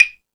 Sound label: Percussion, Drum Machines E-MU Pro-Cussion